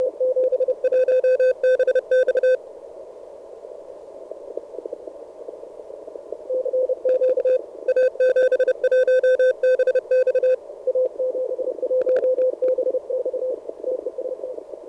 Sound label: qatar 40m cw; 2009 arrl cw